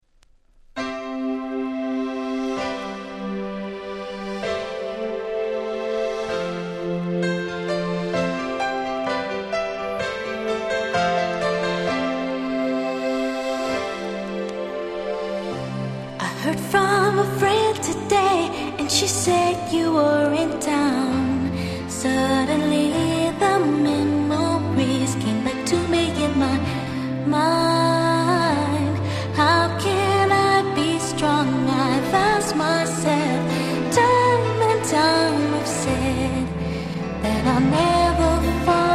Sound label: piano